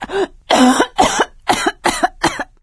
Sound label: cough, survivor voice; source engine; left 4 dead 2; producer